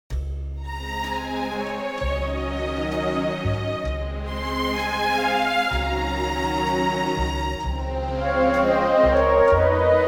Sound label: Db orchestral; key - Db - vocal range - G to C